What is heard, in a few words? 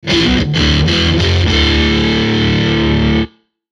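Musical Element, Drum Snare March; Blastwave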